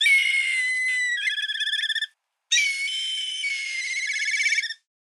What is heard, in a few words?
milvus migrans cry